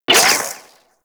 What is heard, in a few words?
Shot, Auto Cross Bow; Warframe; Weapons; Tenno; Prime Ballistica